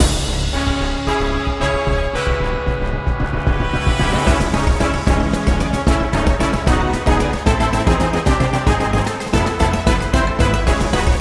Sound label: Combat Darksword